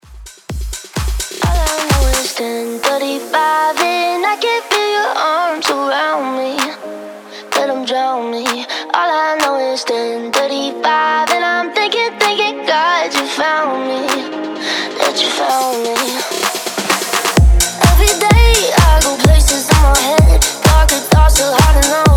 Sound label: Top40s Dance 128 BPM